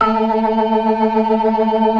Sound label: ORGAN, 4 DRAWBARS; Keith Emerson Signature Series